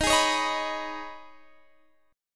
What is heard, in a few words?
Eb 7th Suspended 4th Sharp 5th, Guitar, DADGAD